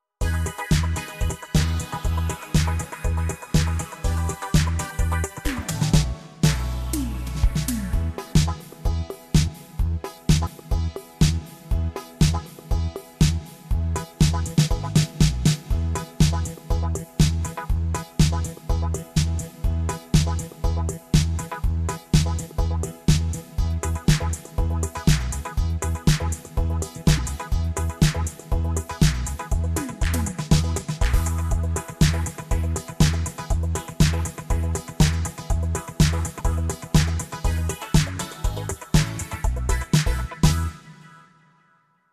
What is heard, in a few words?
disco